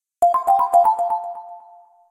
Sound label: ringtone